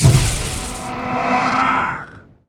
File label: battle hunger; weapons; dota; axe